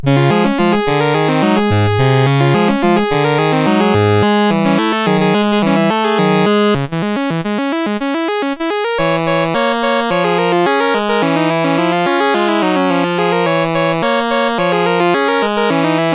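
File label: River Chase, MOS 8580 SID chip music, games; CSG8580R5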